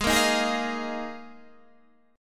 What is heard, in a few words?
A sharp 7th Suspended 4th G sharp, Guitar